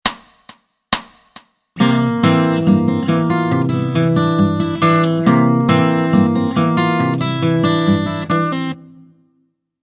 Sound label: Travis Picking